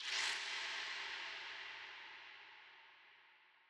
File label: ambience room shadow room scary ambience